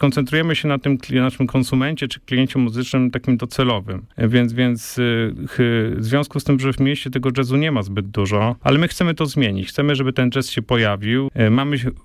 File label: jazz; DOXACAFE